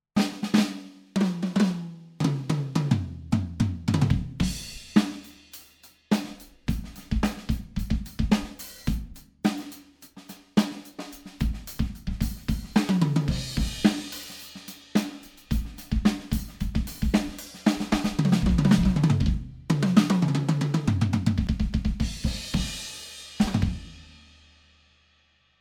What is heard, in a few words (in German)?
Fame Fortress Set2 mit 14˝x 5 5˝ Snare